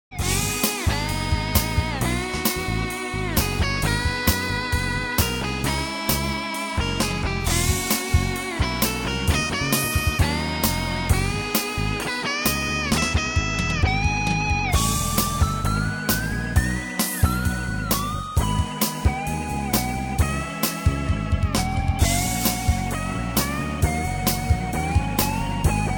duelling guitars

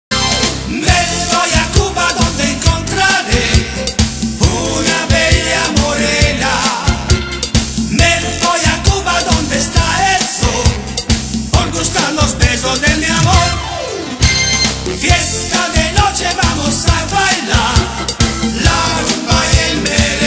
cha cha cha

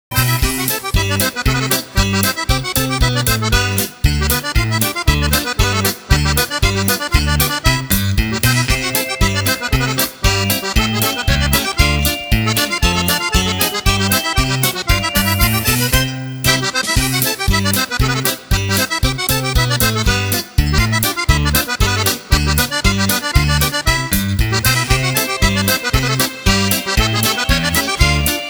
radio polka

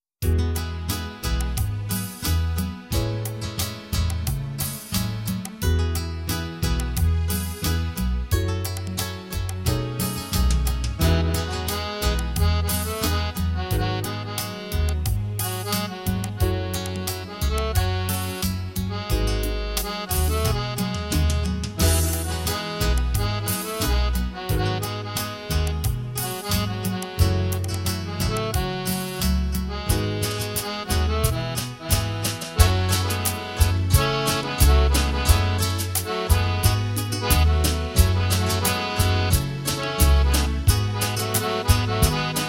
instrumental

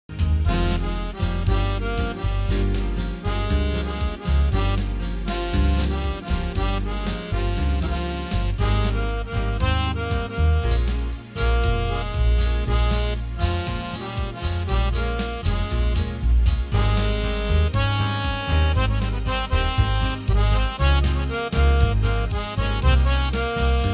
instrumental

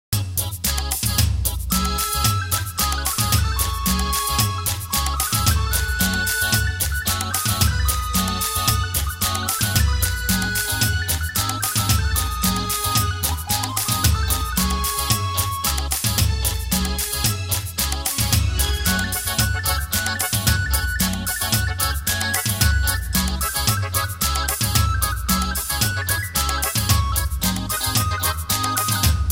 instrumental

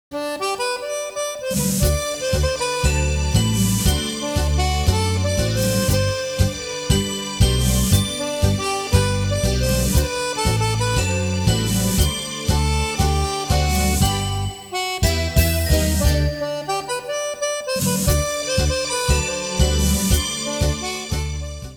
tango

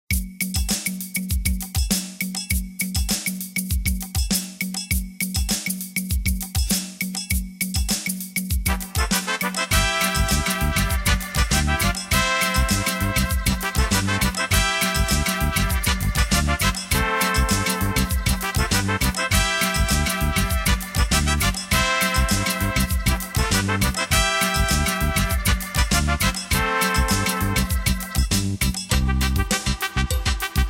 instrumental

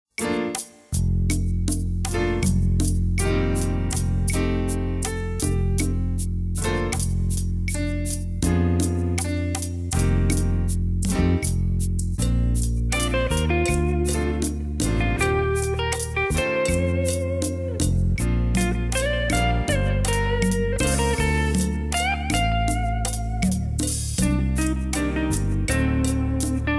instrumental

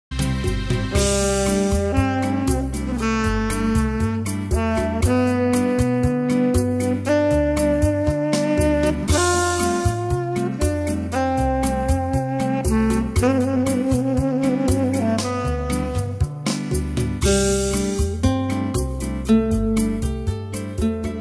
sax and salsa

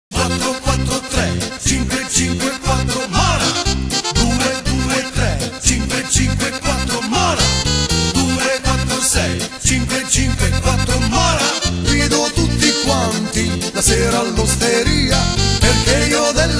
polka

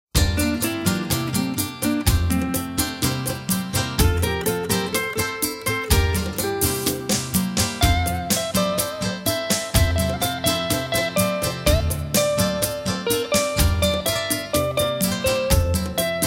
instrumental

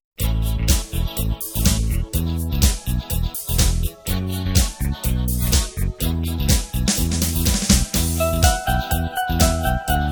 dance '70 instr

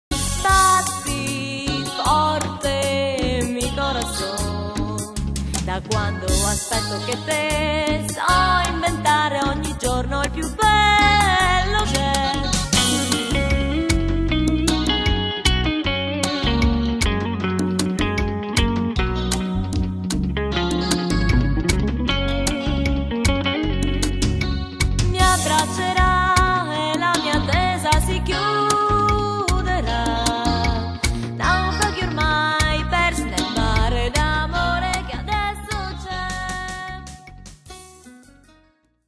cumbia

